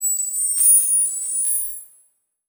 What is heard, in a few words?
Title Transition Crystal Shatter; Adobe; Production Element